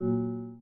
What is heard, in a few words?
Window All Desktops Not; Oxygen; plasma